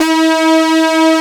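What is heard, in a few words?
Synth Brass G2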